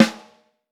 Vintage Snare Drum; Q Up Arts; Johnny.C's Vintage Snare Drum; 5.5X14

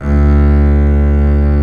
Bass Leg; Digital Sound Factory Virtuoso

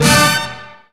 Brass Hit; Proteus 2000; Multisamples; Digital Sound Factory Proteus 2000

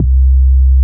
MOOG MID C2, ANALOG BASS; Music Store - Claudius Diemeter Schwerlos

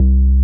MOOG LOW C2, ANALOG BASS; Music Store - Claudius Diemeter Schwerlos

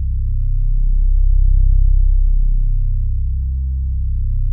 ANALOG PAD C1; Music Store - Claudius Diemeter Schwerlos

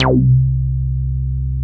Moog C2, analog bass; Music Store - Claudius Diemeter Schwerlos

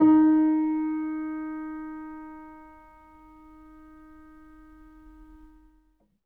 L, Acoustic Piano, PIANO STEREO; InVision Interactive; Miroslav Vitous

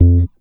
HEY HEY BASS; Sampleheads; Ultra Freakin' Bass

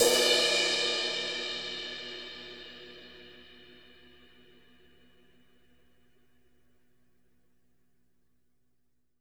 CRASH

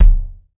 BDV1 -L, Drums Acoustic; AKAI; 90s sample CD; USB Soundscan vol.10; RAW KIT 6

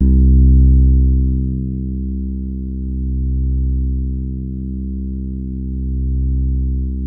COND HARD C1, E-PIANO; Music Store - Claudius Diemeter Schwerlos

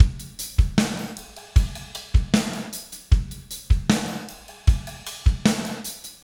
Spaced Out Knoll Drums, Indie Rock; Sonic Foundry (Sony Creative Software); Indie Rock Anthems; Session 12 - Spaced Out Knoll

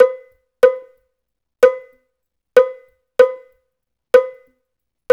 93 Triangle; Tri.Again

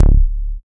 TITE BASS; Masterbits; Dance Trax; BASSDEPARTMT; BASSOUNDS

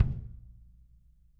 kick, drum kits

